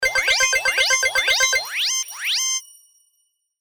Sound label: combo alert; Step Back 7's